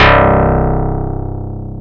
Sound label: TX BASS C2, SYNTH; AKAI S-Series CD-ROM Sound Library VOL-2